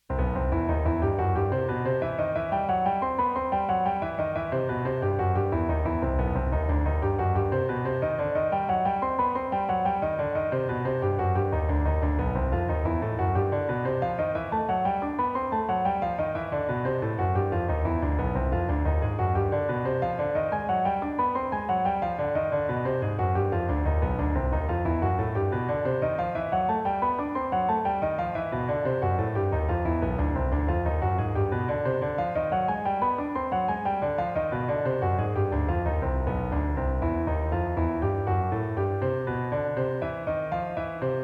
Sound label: Major Minor Triad Embellishment, jazz piano